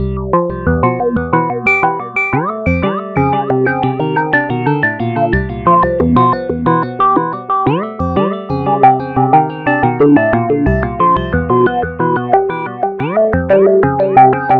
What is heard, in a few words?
acid wall 90